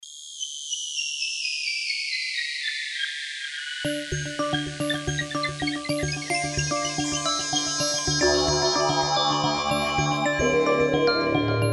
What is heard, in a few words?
Synth sounds; RP Blade